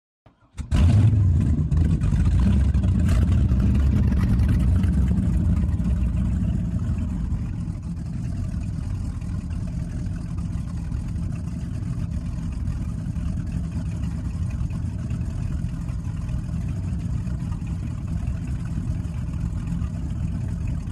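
Power Boat Interior Start Idle, off shore power boat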